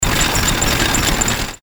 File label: steam engine